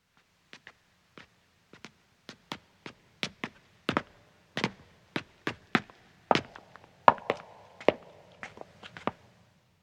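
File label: Footstep stone ascending, 2 men approach and stop; BBC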